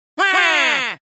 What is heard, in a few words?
WAA; cave